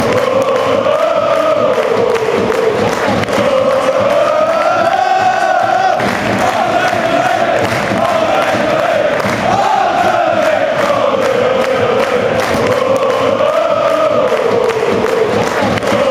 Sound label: allez allez allez, chants; anderlecht